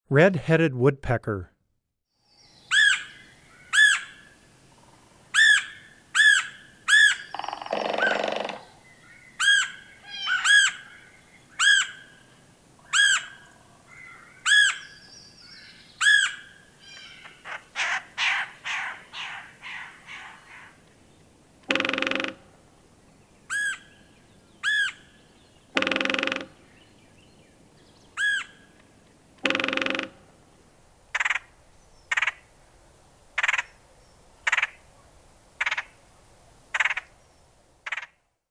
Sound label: Red-headed Woodpecker, Wildlife Sounds